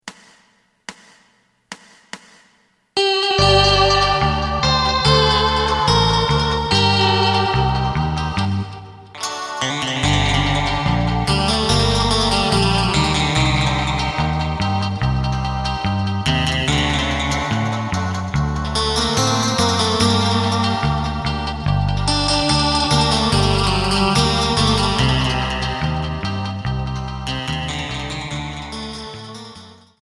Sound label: drum